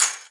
Sfx Darts

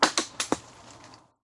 Golf

